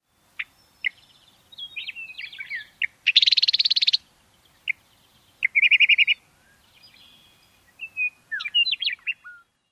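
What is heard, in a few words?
western meadowlark churts, sputters, & song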